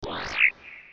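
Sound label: sound transform open, Animal Life; Nokia 6270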